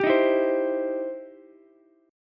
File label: E Minor Major 7th Sharp 5th, E, down, Ukulele; EmM7#5 strummed